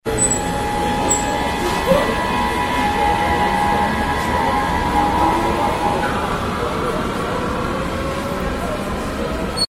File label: metro frankfurt; fieldrecs